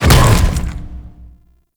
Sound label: bowl ball impact; Warframe; Grendel